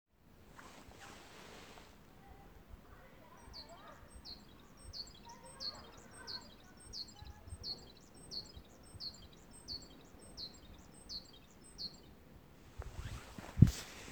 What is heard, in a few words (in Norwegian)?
fugl